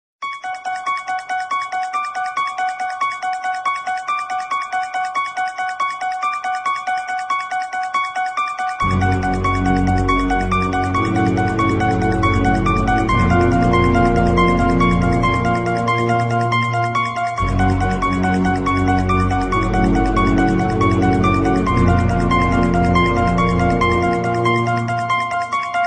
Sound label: scary horror background theme music tone ringtone